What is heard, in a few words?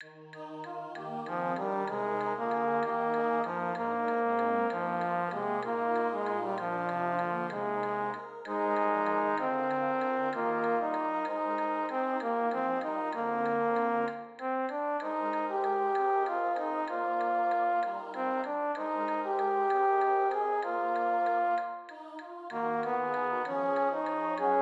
tenor, Voice Dance